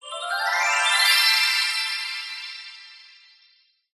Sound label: fantasy magic glissando 90s style dreamy ascend